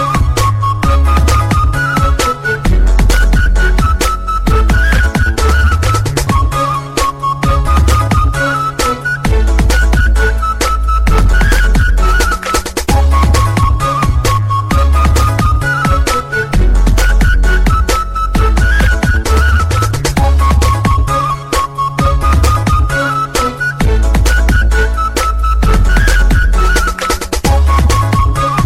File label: Arabic Flute Remix